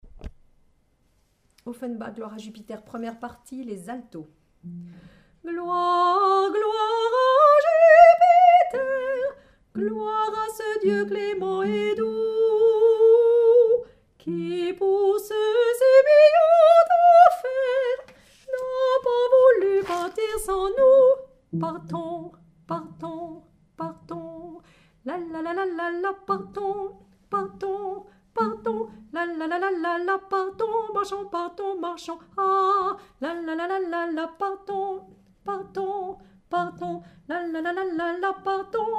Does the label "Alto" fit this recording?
Yes